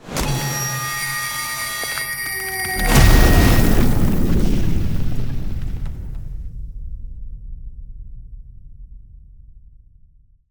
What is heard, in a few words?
Ice Spell Explosion Impact Arctic Blast; Ice Explosions & Impacts; Arctic Aura